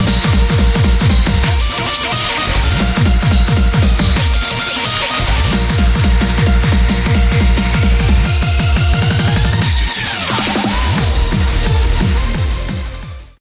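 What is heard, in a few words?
background music crossfade; Invaxion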